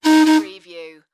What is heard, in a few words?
Trolley Air Horn Beep